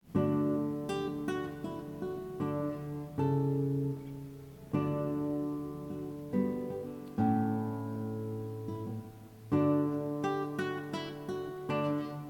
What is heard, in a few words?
Minuet de Visee